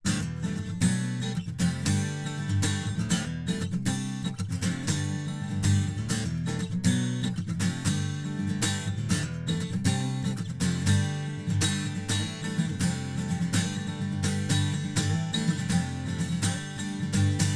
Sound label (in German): niere strumming 12 bund schallloch schraeg; fame pro series sd-100 test